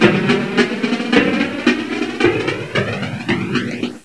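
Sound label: marching band, percussion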